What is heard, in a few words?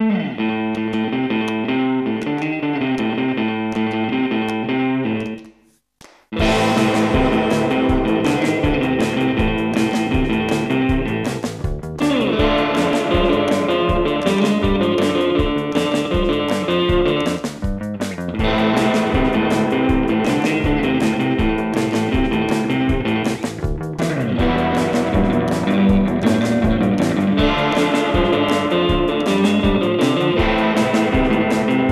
Surf Blues